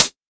00s Prominent High-Hat Sample D# Key, Royality free pedal hi-hat single hit tuned to th; hat samples